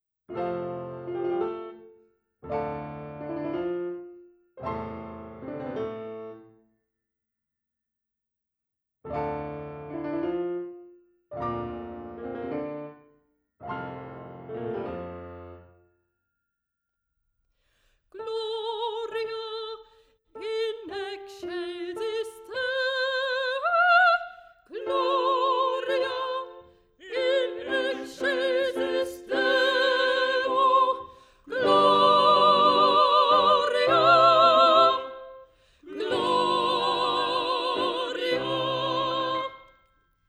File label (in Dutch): Sopraan, Gloria; Rossini; oefen CD; Versterkt